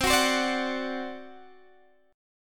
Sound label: C Suspended 2nd Flat 5th C Guitar Standard; strummed; capo 3; 20,21,22,19,19,x